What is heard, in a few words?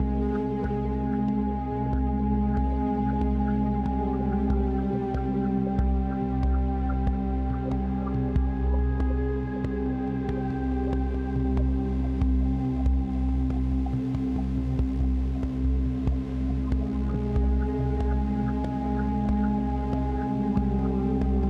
MUS loop